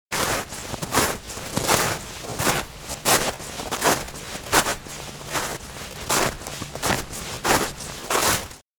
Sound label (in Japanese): walk yuki